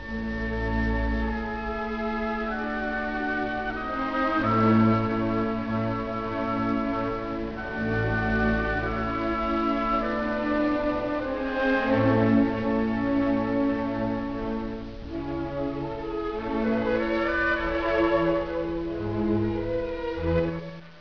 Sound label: mozart violin